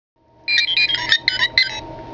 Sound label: r2d2 sound; ROTS